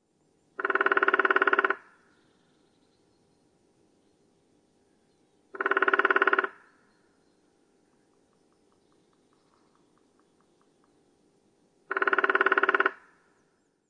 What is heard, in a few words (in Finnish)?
pikkutikka rummutus; Jannes Hannu